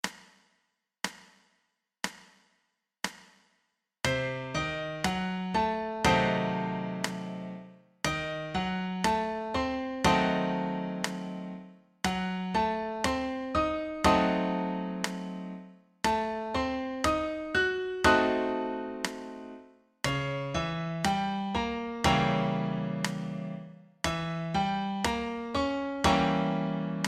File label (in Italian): fisarmonica accordion, pillole di jazz gli arpeggi nel jazz; Pillole di jazz… Gli arpeggi nel jazz “per piano e fisa” – Lezione n. 3